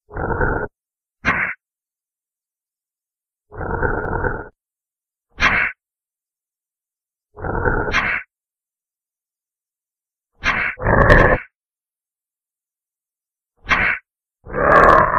lince sonido

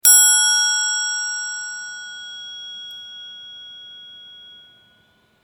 Triangulo

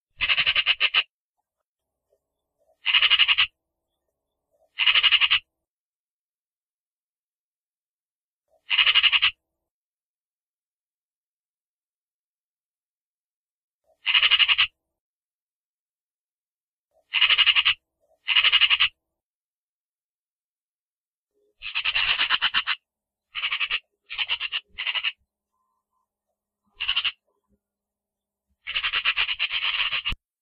Sonido de la Urraca; Sonidos cortos de Animales

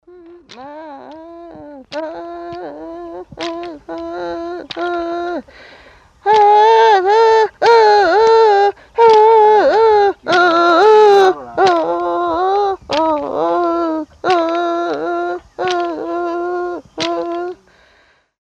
chorote palo sonajero

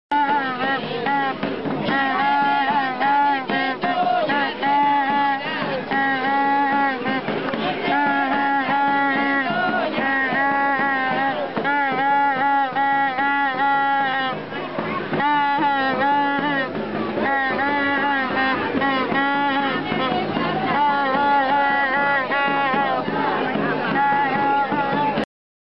Clarinete; Erkencho

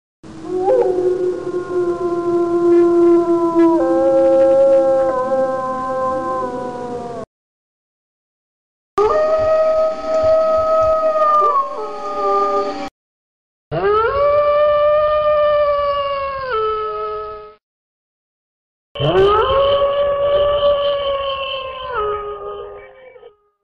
lobo sonido

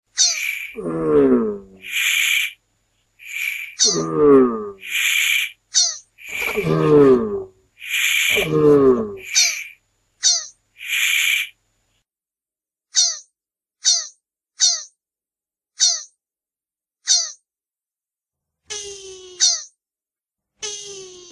Sonido de la tortuga Sonidos cortos de animales

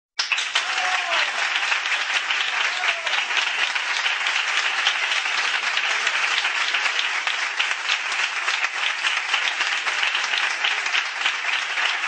Aplausos y gritos del publico

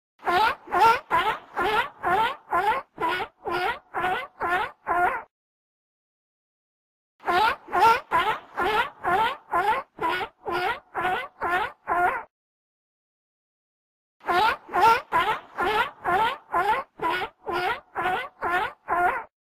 Sonido de la foca

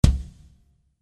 Bombo

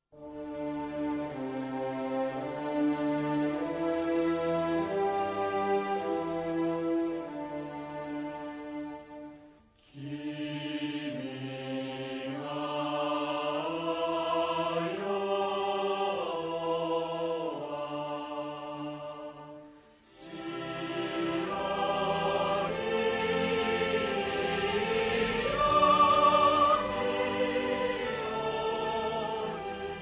HIMNO Y BANDERA DE JAPÓN

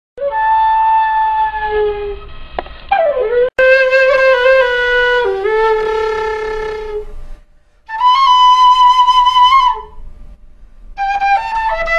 quena

